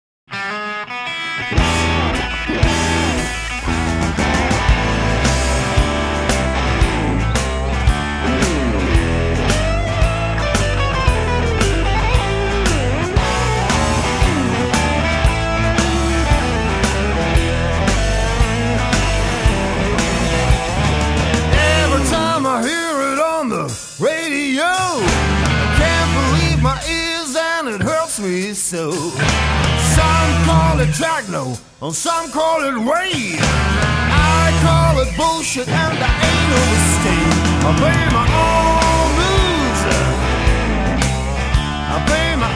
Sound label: own blues